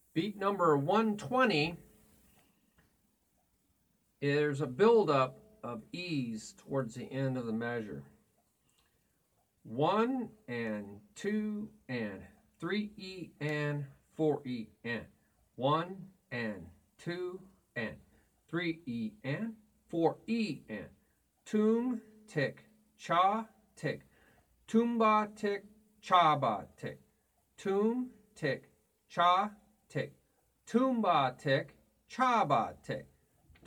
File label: Beat 1 2 3e 4e Toom Ti Cha Ti ToomBaTi ChaBaTi